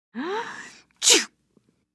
Female Sneeze Polite

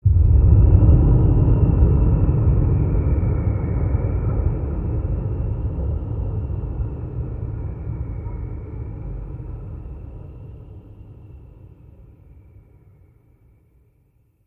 Airsting Very Heavy, whooshes & swishes

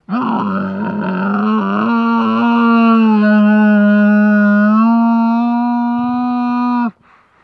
Camel Lng Extended Mo, camels

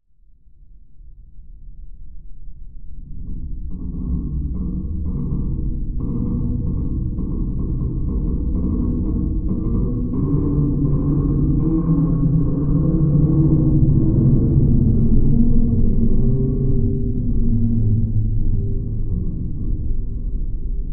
Underwater Int Low Me, metal groan atmospheres; Underwater Int Low Metal Stress

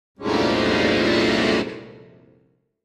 Accordion Bass Keys; keyboards

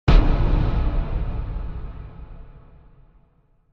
Impact Metallic Thud

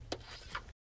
Bicycle Kick Stand Do

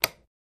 Light Switch Wall Switch; switches

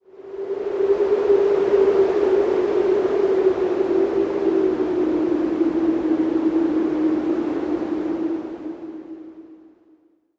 wind howl, ambiences

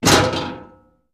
Switches, Industrial

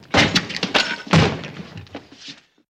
Door Kick, Wooden and Metal Rattle

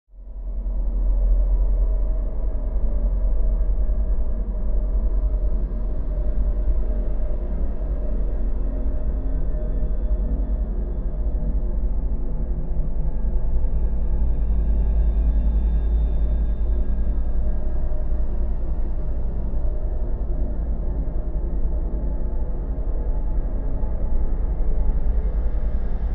Bed Haunting Drone

